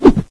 faster miss

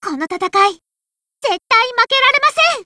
reloading; nepgear

